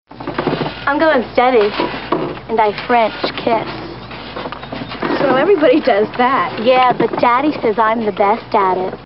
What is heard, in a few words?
French Kiss